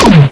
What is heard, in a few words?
fusion firing, weapons